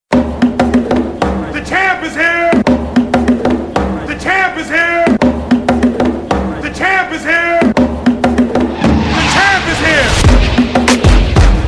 instrumental

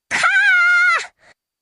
haaa

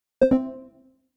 discord user left vc activity

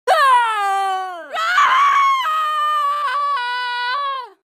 extreme boost k o scream; mk9; sonya blade

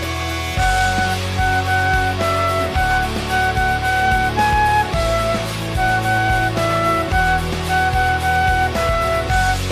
flute cover

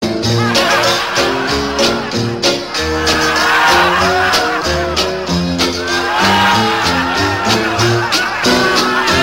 old time laughter

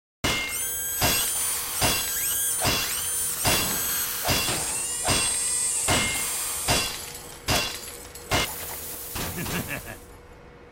dispenser idle wrench

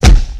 punch soound

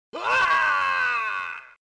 death scream; fatal fury; terry bogard

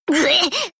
bleh

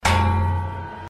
field goal miss sound; parkey

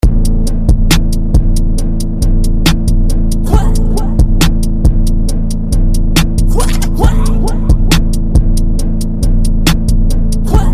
tiktok beat

